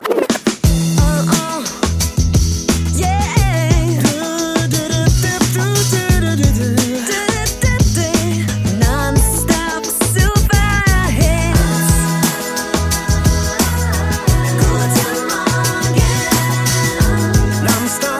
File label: pop jingel morning